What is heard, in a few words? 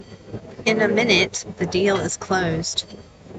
mixed caf